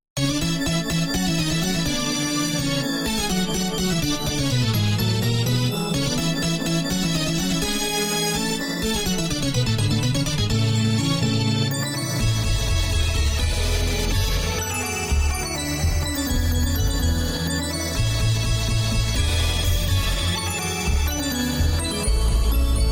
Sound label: clarinet